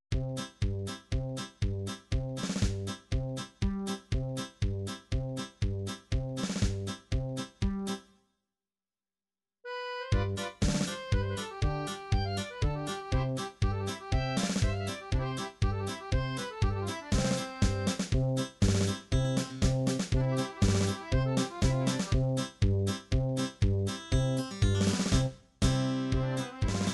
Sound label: techno tex mex, midi